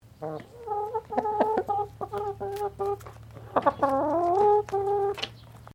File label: Bird Chicken Highliner Brown Cluck; Birds from Collingwood Children's Farm; Animals